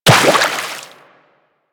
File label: Run Water, Footsteps; Warframe; Operator